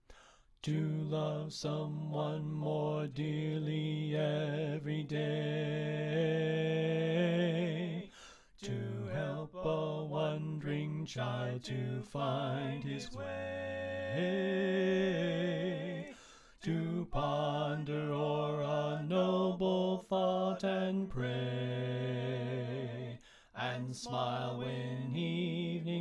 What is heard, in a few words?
Bass Left